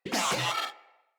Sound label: Moa Vocal Pain